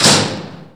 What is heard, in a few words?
metal door stop; gesource